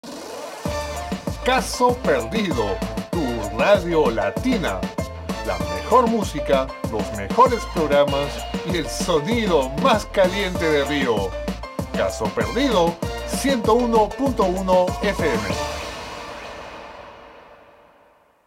Radio Car Latino Jingle; gangstar rio city of saints

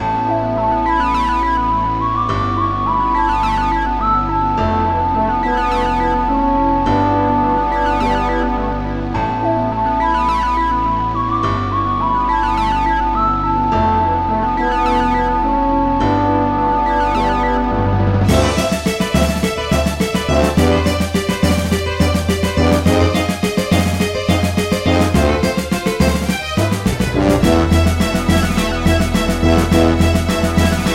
Start Menu; Namco Museum Megamix; Grobda

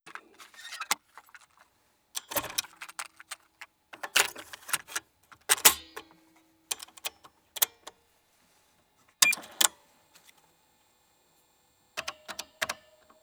WAKE UP (PC-8001); New City Hero

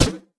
Hit

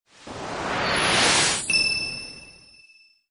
hatch done sound; Caravan Beast

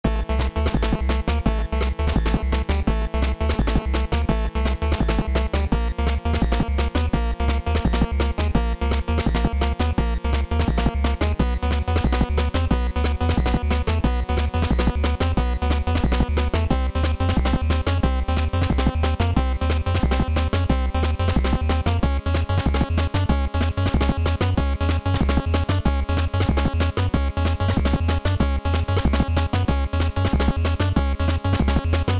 Hurry Up!; Waterfront; Teenage Mutant Ninja Turtles Tournament Fighters 1993; NES

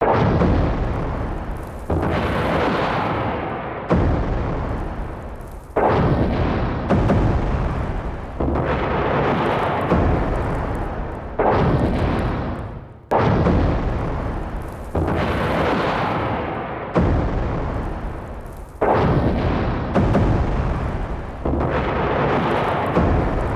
ambient bombing inside

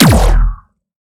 Sciweap Shot Medium Laser Ray Phaser

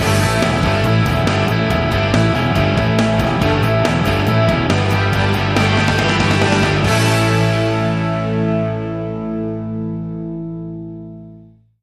Electric Rock; Tag; GoAnimate

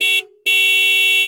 Car horn

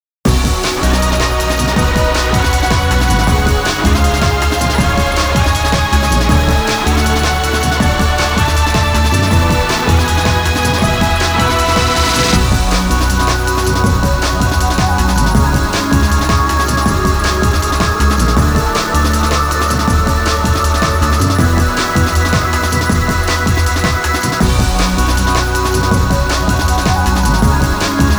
Stageclear; Psyvariar2

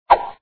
Swing; Dad 'n Me

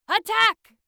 Attack; Voices Attack4-1

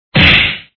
Hard Punch; Dad 'n Me

